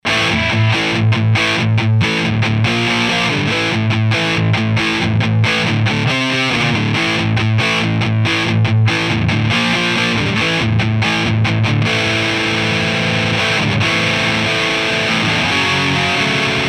AMP DIst

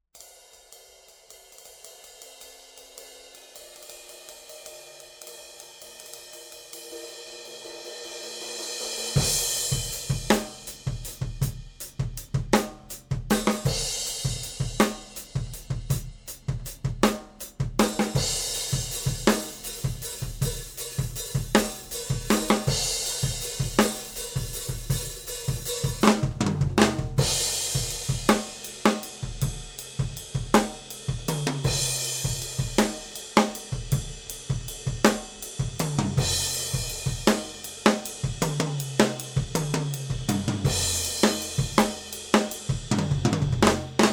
Drum Overheads

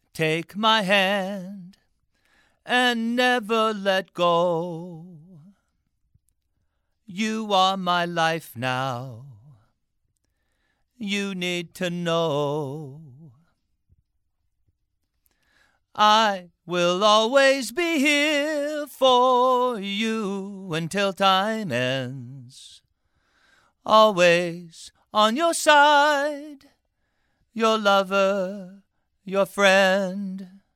Male Vocals I5

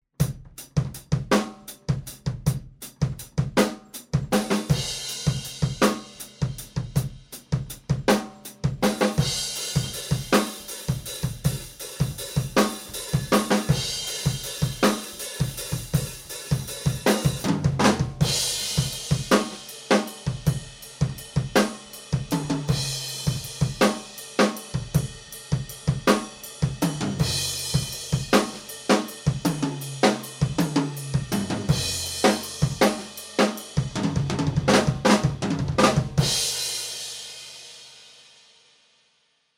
Room Mics SM58

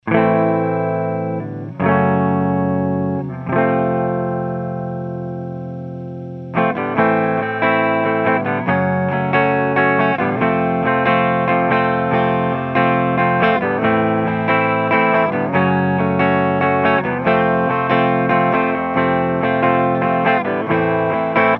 AMP Clean Gemini II, clean eguitar

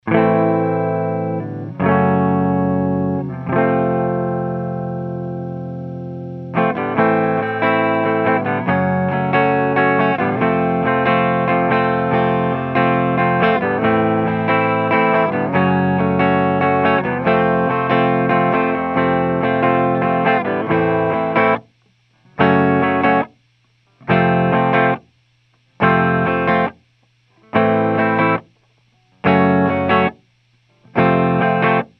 AMP Clean U67, clean eguitar

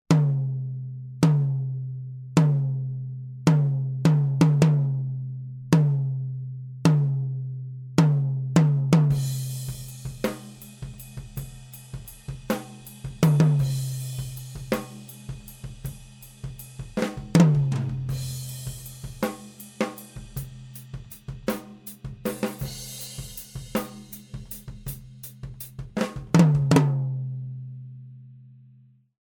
Tom Microphone RN17

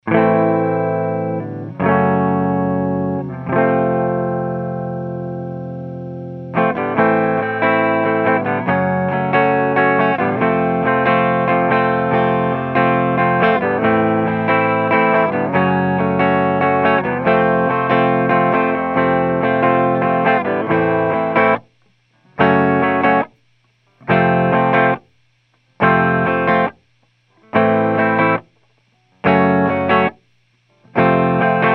AMP Clean SCX1, clean eguitar

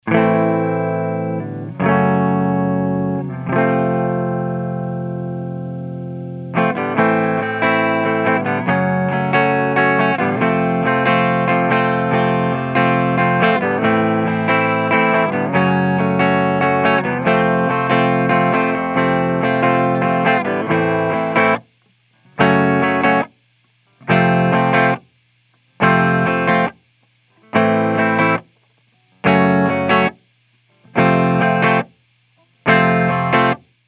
AMP Clean I5, clean eguitar

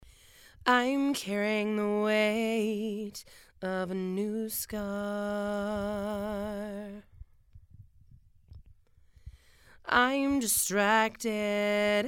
Female Vocals

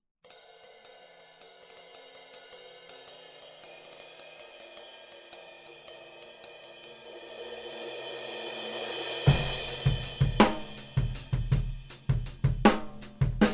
Drum Overheads Tube; Gemini 5; mic shootout

